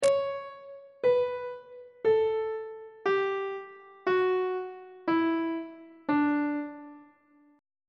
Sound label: d major scale descending; on treble clef